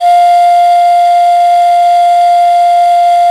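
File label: dance whistle, American Natives; E-MU Formula 4000 Series Vol. 4; Earth Tones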